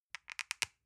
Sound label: lotion lid open soft; berufe; friseur